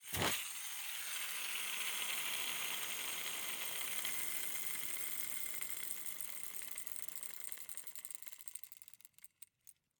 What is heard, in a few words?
Roller skates, old fashioned, all metal, wooden wheels, swipe, spinning, slow to stop, thin; Eiravaein Works; Vaeyan IV